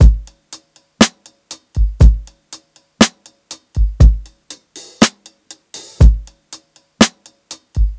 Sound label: 120 HALF TIME, DRUMS LOOPS; BASEMENT DRUMS VOL 2